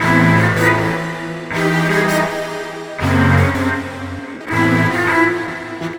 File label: Strings Drkcomp; Sonic Foundry (Sony Creative Software); Knutrix Drum & Bass